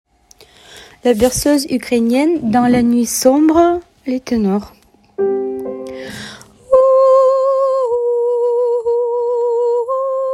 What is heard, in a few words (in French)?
tenors, berceuse ukrainienne